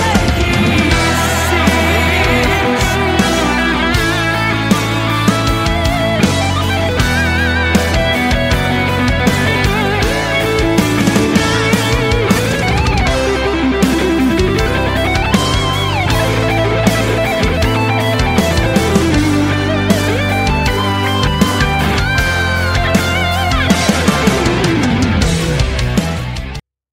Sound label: SOLO